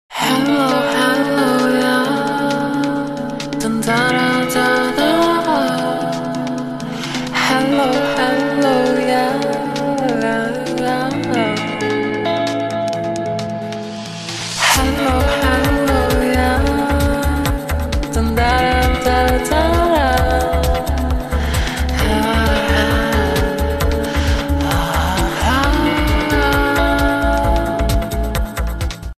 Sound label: Huawei Pocket S, ringtone